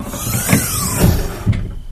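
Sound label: Hatchback Hydraulic Whoosh, trunks & hoods; Hatchback Hydraulic Whooshing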